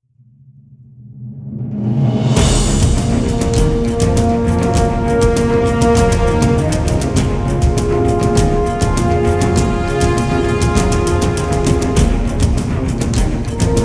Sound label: the ship is landing; Das Showreel von Yves Michel, Musikproduzent und Composer